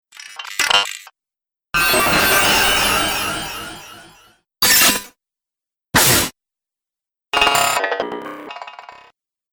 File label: Droid Variety, robot; Variety Sound Pack 04; Robot Sound Effects